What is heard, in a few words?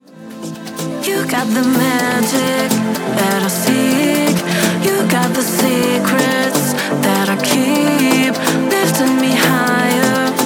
Electronic Dance Music